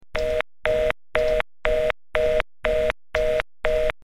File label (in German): getute beim auflegen busy signal